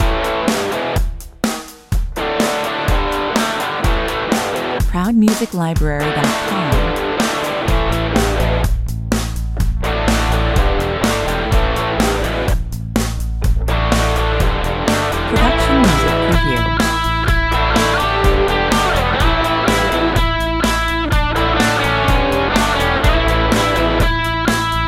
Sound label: break out